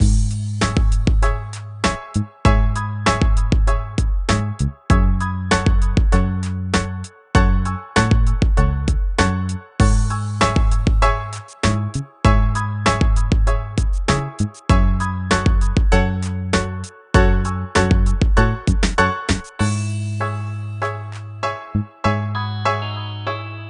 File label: Beautiful Music